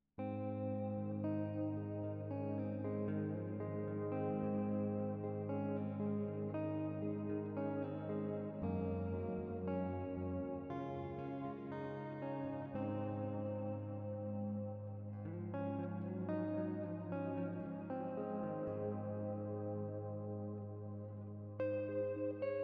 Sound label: Stereo Reverb; Binaura